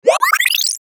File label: ASCEND SPUTTER, Warning, Alarm; Multimedia; Blastwave